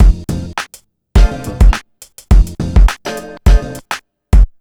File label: HipHop Break Combo F maj; Digidesign; Pro Tools Essential Loops